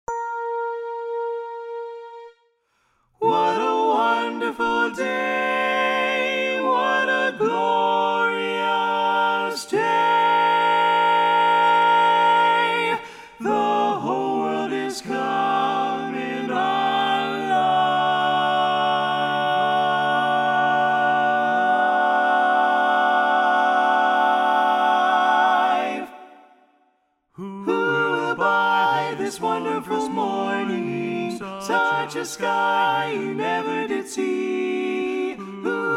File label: - satb tenor